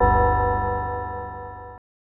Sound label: chime